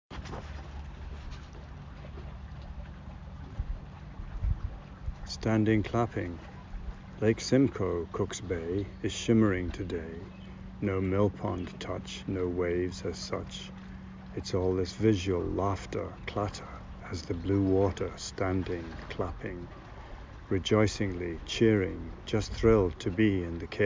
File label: STANDING CLAPPING; Red Thread Poets